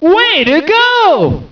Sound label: HURRAY